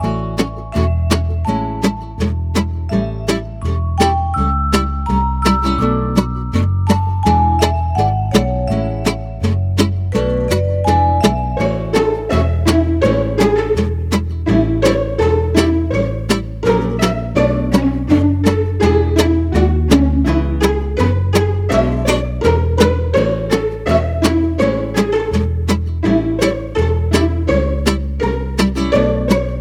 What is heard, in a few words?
cheerful entertainment pizz; by burak sound bank; cheerful vol 16